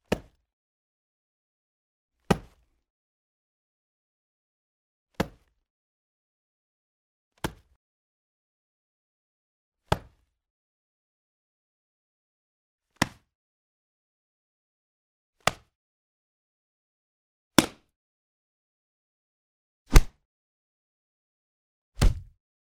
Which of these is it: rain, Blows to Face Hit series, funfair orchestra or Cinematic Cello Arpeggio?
Blows to Face Hit series